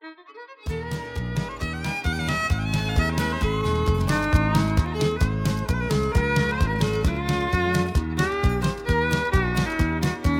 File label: Instrumental Low Key